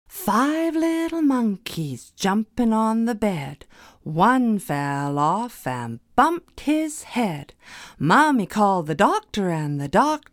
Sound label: five little monkeys music